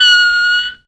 oilin sax; Zero-G; Funky Elements

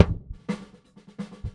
Rumble Snares; Airwindows

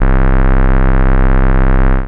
Single Electric Basses, Dance Floor Bass

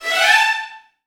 VN FX, Virtuoso Strings

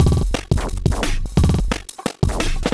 Glitched Beat 175BPM; glitch kit

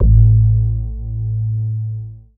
bass attack, techno trance

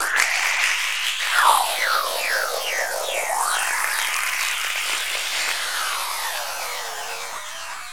Suction, Environments; Sonic Foundry (Sony Creative Software); Myths of Technology

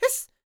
YELP, World Voices, ANDES FX

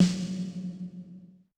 Amb, Yamaha DTXpress-II snare

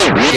SCRATCHES

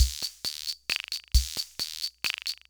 Loop, sr-95; classic machines

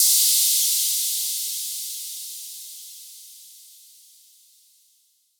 Cym, Waldorf Blofeld

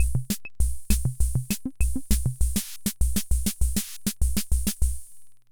Hammond Auto-Vari 64 Loop, drum machine

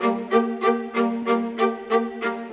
Viola, Orchestral; Sonic Foundry (Sony Creative Software); Orchestral VOL-2; Modern 29

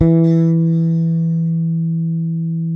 bass sustain E3; Sampleheads; Will Lee Bass Library

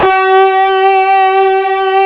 STUDIO C5, guitar lead

